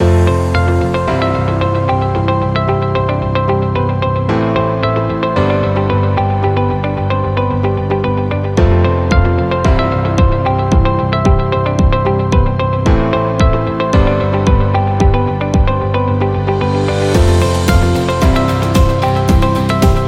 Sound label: Corporate Loop; explainer